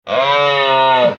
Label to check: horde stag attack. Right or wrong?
Right